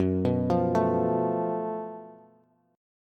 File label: Gb Minor Major 7th Double Flat 5th Gb Bass; arpeggiated; 14,14,15,14